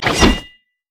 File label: crit attack; Xin Zhao